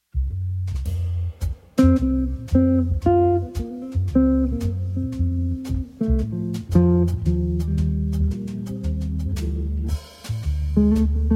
trio, organ

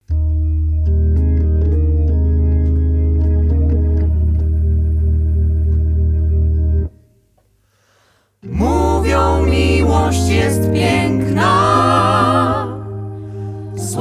vocal group feat. organ trio

